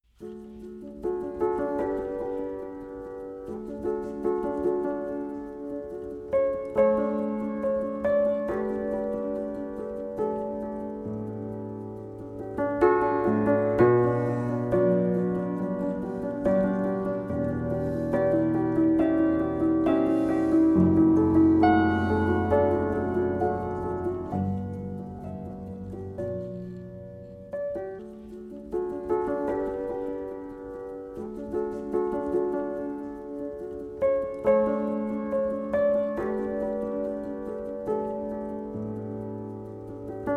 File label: piano miniatures